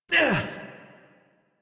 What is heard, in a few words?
Exert, Adult; Melee Swing; en; Warframe; New War; Adult Operator